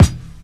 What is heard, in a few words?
clicky kick single shot f key; drum single hits one shots; kick drum samples